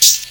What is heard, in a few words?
CABASSA, Ragga & Dub Reggae; USB Soundscan vol.27; AKAI; CABASSAS